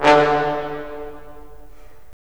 BRS, Roland LA Composer

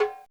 Bongo; Coldcut's Kleptomania VOL1

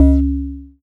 C#, BELL GONG, Synclav FM; Big Fish Audio

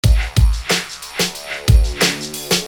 Beat, Hip-Hop Street Beats

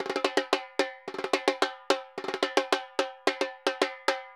Repique Murga 110 bpm; Best Service; Festa Latina VOL-1

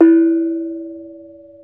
BONANG, Bronze Gamelan

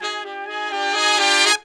Pop & Funk Brass

